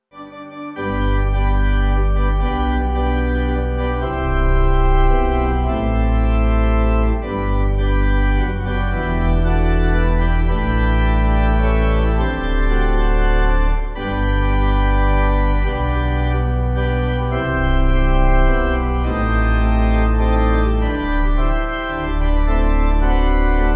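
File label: Pipe Organ, Music Backing Files for Churches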